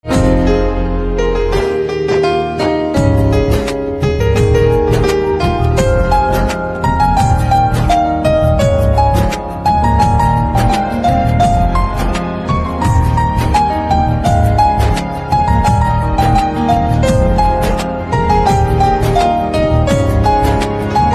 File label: Piano Instrumental Ringtone